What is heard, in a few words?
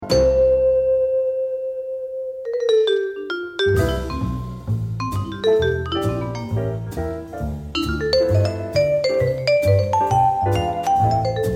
Vibes Solo